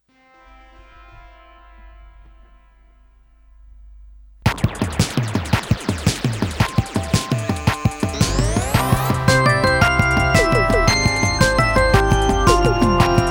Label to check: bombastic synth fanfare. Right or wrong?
Right